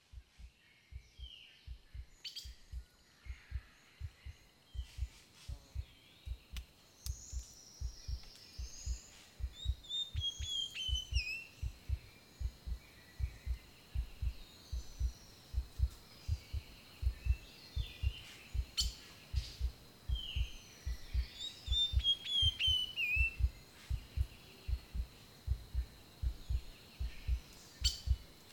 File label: Spring Birds